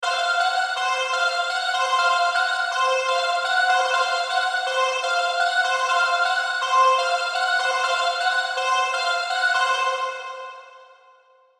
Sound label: MELODIC Fm 123; UNISON